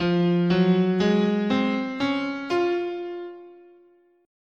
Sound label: F Balinese Pelog, scale